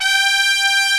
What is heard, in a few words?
POP BRASS